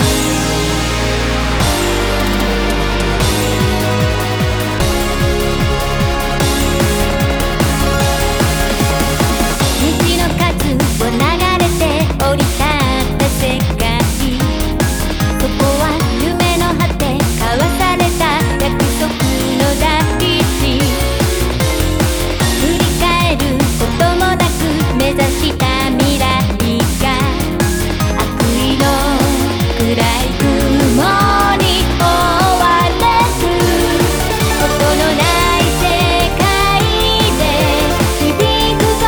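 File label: background music opening; Ginga Force; OPsize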